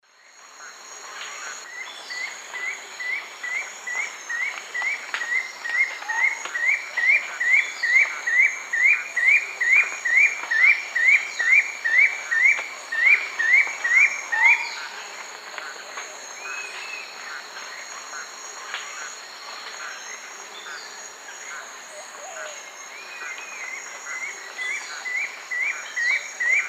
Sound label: Bucco tamatia, song; S. America; Eastern Lowlands